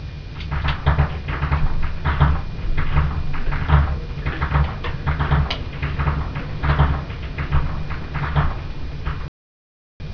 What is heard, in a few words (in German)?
schnalzen; Hogl; jugend